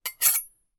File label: household knives large scrape together